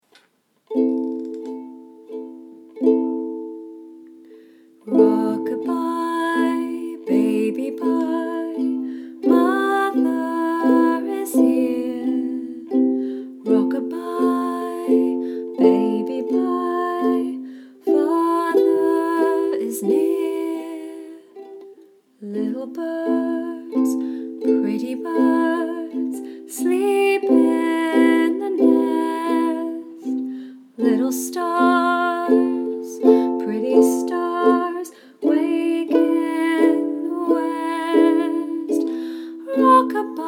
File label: lullabye, ukulele; ukulele beginner